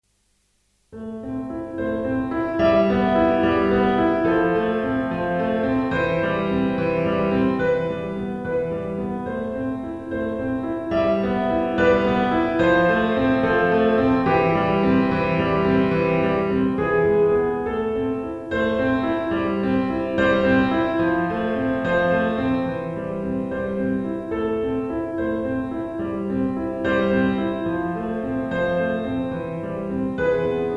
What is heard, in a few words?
piano pop